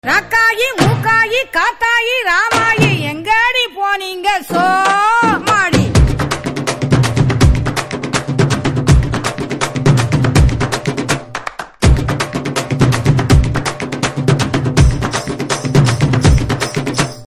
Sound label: drum beats ringtone